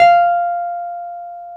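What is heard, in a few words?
piano Wurly; Keys of the 60s and 70s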